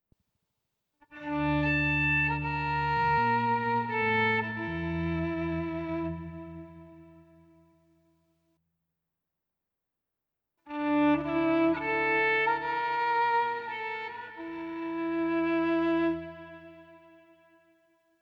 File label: Soundscape (Instruments Only)